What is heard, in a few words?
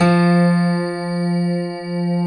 GRAND PF F 2, AKAI S-Series; AKAI S-Series CD-ROM Sound Library VOL-7; D.GRAND PF